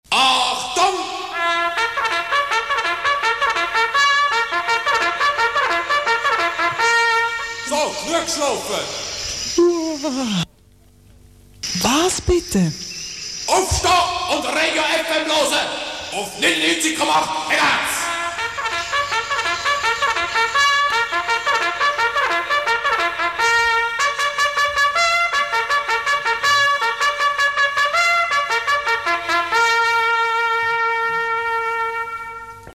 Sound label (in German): jingle trompete; regiofm